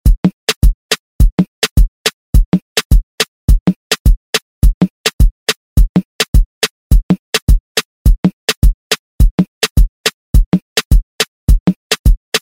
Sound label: Latin Urban Redrum; Deejay Hit